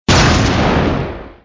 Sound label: Space Explosion; Angry Birds Space